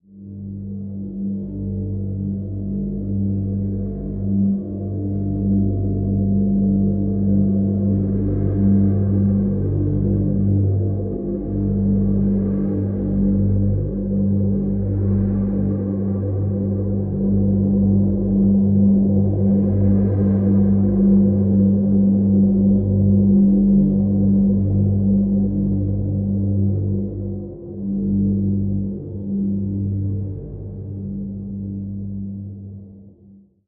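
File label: drone G; Drn Caverns Deep G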